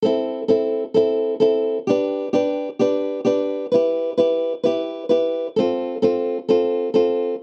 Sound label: Am G Em F